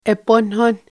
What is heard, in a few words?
drumming